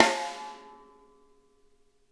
SNARE

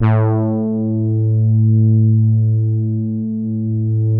poly hard A2, soft brass; Music Store - Claudius Diemeter Schwerlos

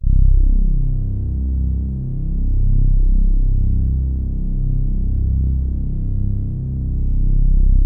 C1, ANALOG PAD; POLARIS; Music Store - Claudius Diemeter Schwerlos

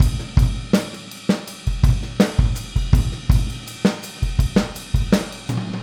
Boogaloo Chorus Full Mix, 82 BPM

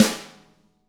DW HIT, Drums; ILIO; Double Platinum Drums 1

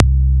Moog C2, analog bass; Music Store - Claudius Diemeter Schwerlos

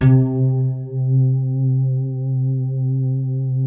NYLO SOFT C2; Music Store - Claudius Diemeter Schwerlos

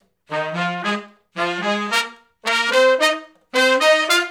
R&B Riff (F), Horncraft, Sections

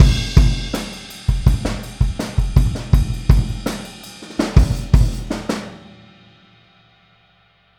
Boogaloo - Outro - Kick, 82 BPM; Sonic Foundry; Drums from the Big Room The Mixes

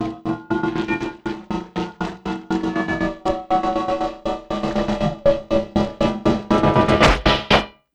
Envelope Beat; Beats; Sonic Foundry; Drums & Drones

